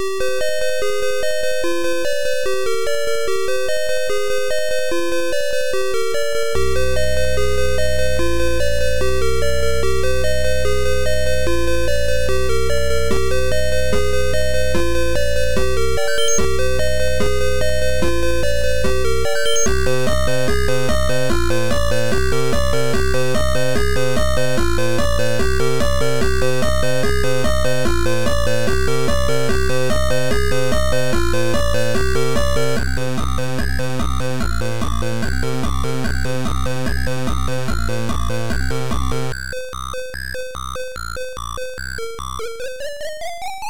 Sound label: Guitar song dream mix